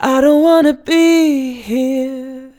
I don't wanna be here, Male Vocals, Phrases C Major 150BPM; Ghosthack; Free Male Vocals and Atmospheres